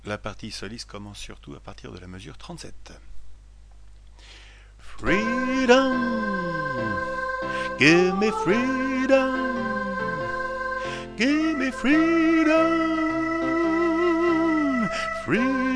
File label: Freedom Solo